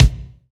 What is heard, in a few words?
kick, natural kick; Roland L-CDX-01; KIK Natural K1